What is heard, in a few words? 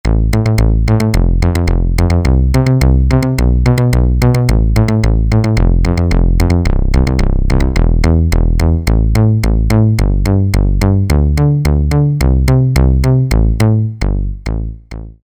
Click Bass, Korg MSC-3S Drums